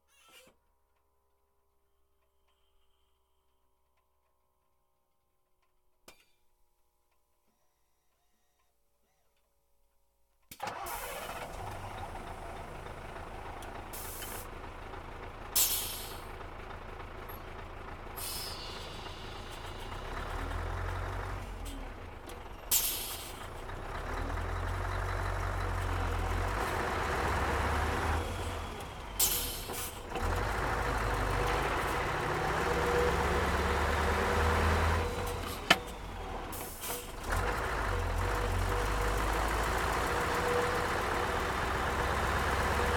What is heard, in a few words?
Scania 143 ONBRD SLOW Start Drive Stop Reverse Drive Stop Off ENGINE Gearbox; Scania R143HL 1990; DPA406